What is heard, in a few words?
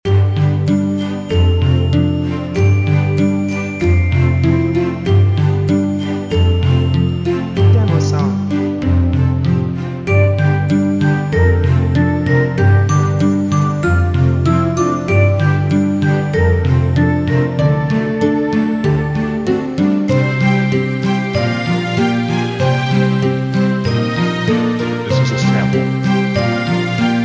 Tricky Adventure Loop